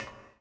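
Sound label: reverb walk, footsteps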